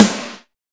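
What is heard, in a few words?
WHOOSH SN, snare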